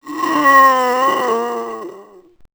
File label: zombi dead; creature; GammaAge